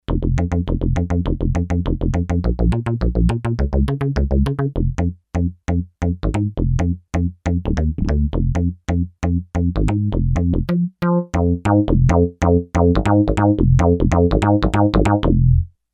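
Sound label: Tekno Bass, Kawai K5000S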